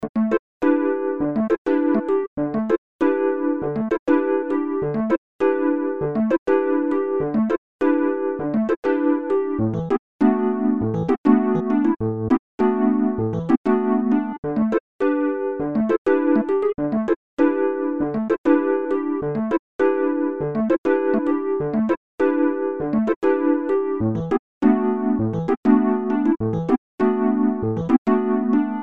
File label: e piano loops